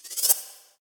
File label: lethal strike vanish; hero; mirage